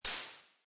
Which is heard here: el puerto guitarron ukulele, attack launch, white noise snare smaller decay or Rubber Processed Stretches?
white noise snare smaller decay